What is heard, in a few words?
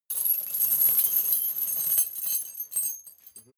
metal chain midsize handling slow